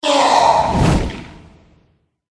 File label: mage boss death